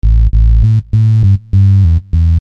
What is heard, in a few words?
Hard Synth Bass; Construction Kit; HIP-HOP; Sonic Foundry (Sony Creative Software)